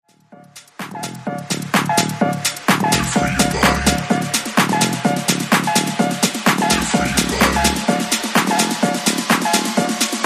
2000s Tech House

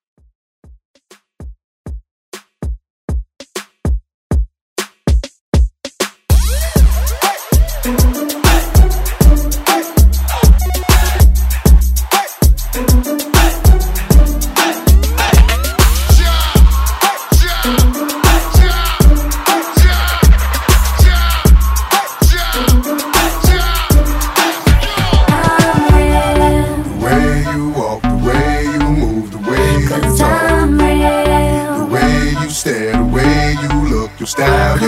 Dancehall Vocal Edit

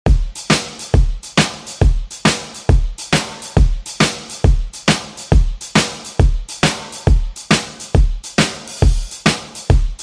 Funk Redrum, 137 Bpm

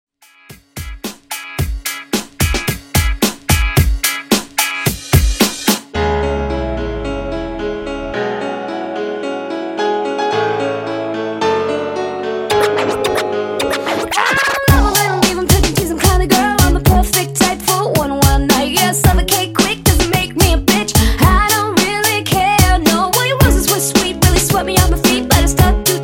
Throwback Redrum, 110 Bpm

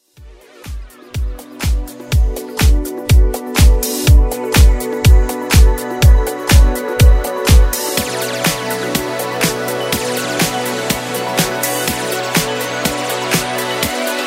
Funk House

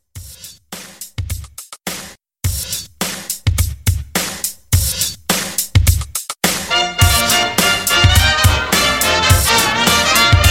Christmas Re Drum

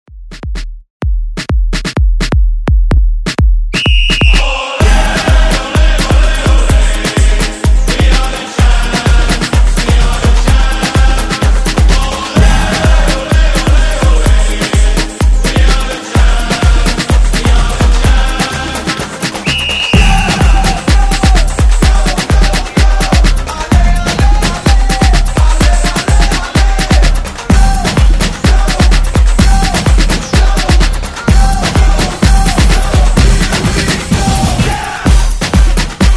Re Drum 127Bpm

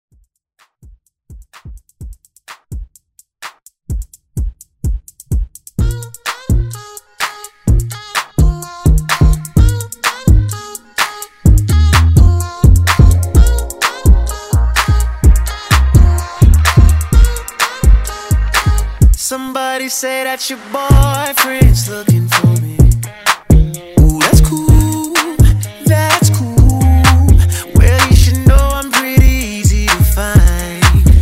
Pop Redrum

